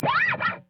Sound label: String Noise